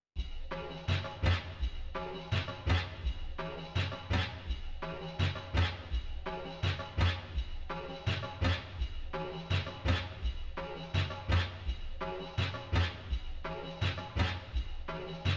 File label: 167 BPM F Min Percs; Melody; Reverse; Stems; Cymatics; 2022 Melody Collection (BETA); Omniverse